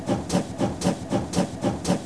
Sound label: Train; Mr. Wonder's Greatest Toyshop on Earth; OmniMedia